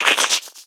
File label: Flycaller Attack